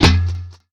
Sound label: Grenade Launcher